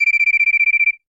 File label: Alarm Cricket; LG VS740 Ally; alarms